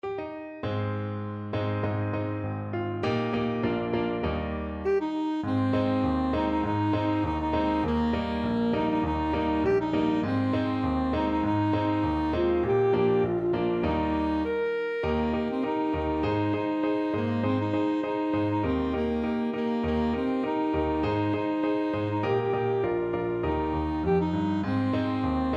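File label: alto saxophone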